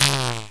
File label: zap; duke nukem total meltdown